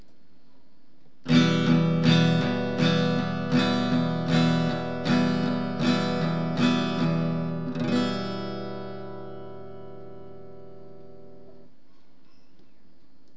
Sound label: strum steel